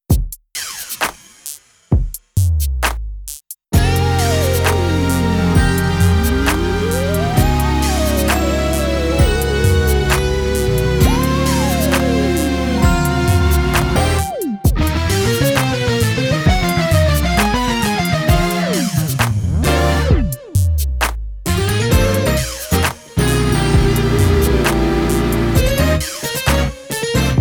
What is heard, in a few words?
From the Top drumless 132bpm F min